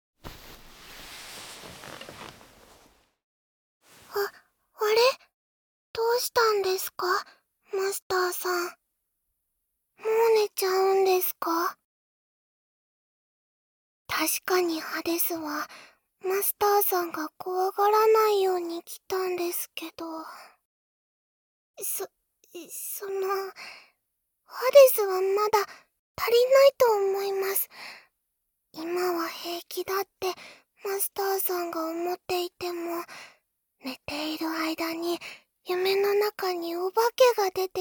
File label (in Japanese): マッサージ, ASMR; 【ブレxブレASMR】ハデス編 ～さみしい夜に寄りそいあって～